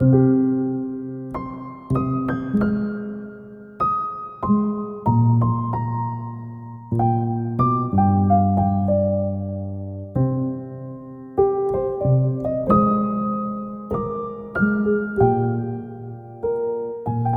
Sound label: Boss End Piano High Volume